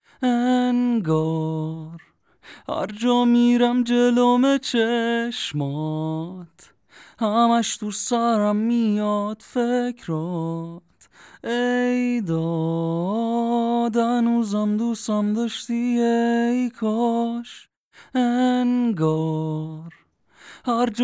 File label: vocal